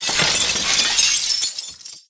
glass fall; STALKER; IWP

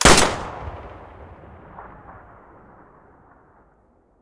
muzzle hpsa stereo, weapons

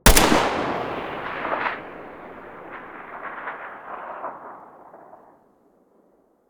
galil muzzle mono

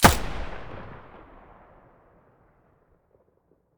muzzle; S.T.A.L.K.E.R.; IWP; vityaz; pp1901

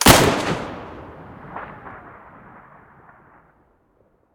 mosin muzzle stereo, weapons

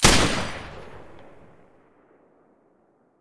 silencer M249 stereo

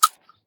Shell 12ga grass; STALKER; IWP

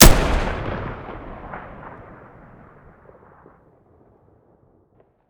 rpk shoot stereo, weapons

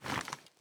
aim out, weapons; STALKER; IWP

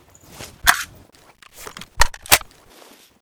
hk416 reload

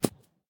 shell 39mm grass; STALKER; IWP

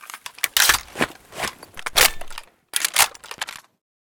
l85 reload empty, weapons

